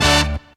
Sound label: brass stab